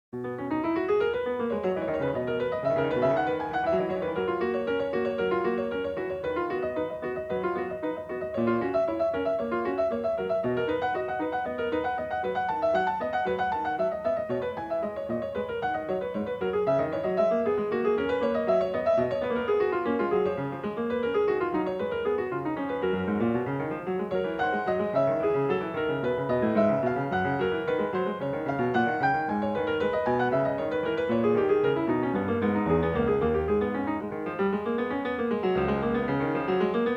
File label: Prelude No. 23 in B major; BWV892; Bach; Glenn Gould; The Well-Tempered Clavier; WTC Vol II